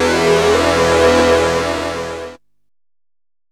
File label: DR. LOOP, TRANCE; X-Static Goldmine 3